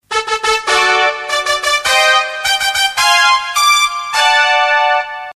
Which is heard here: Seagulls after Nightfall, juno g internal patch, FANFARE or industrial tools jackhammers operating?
FANFARE